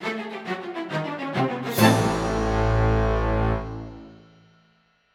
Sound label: music stings stinger; LEGO Movie Videogame; PlayStation 3; pirateship